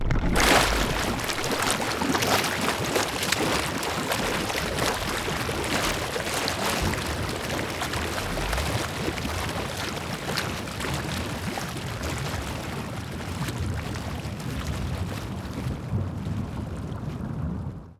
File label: sinking Ship; GGJ 2017; IronToad